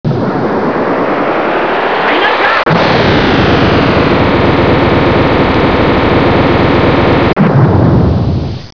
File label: empty recycle bin; dragon ball z